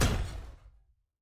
Footstep Robot Large

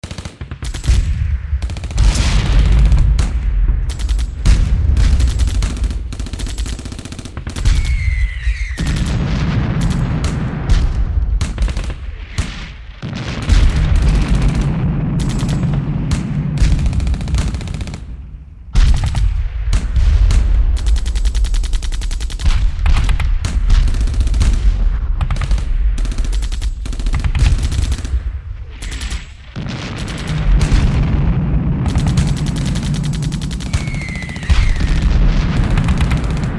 Ambient Distant Battlesound Loop

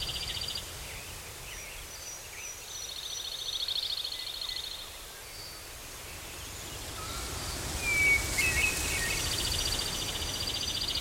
ambient birdforest; bgm

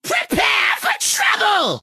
Rocket Taunt

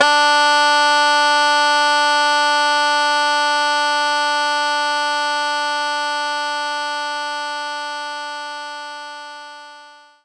banjo D4

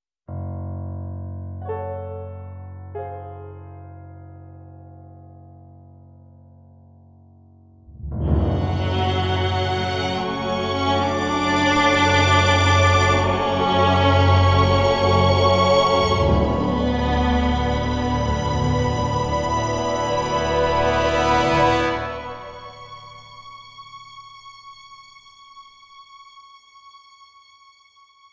Defeat No Loop

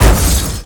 Car Crash

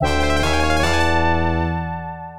Fanfare I; Lethal Enforcers; Genesis

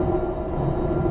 elevator middle

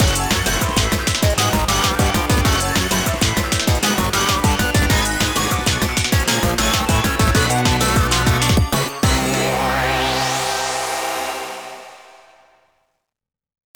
Jingle Vs; Arcana Heart 3

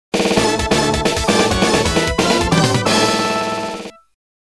Pattern Clear; Konami Shooting Collection; Go Ahead!

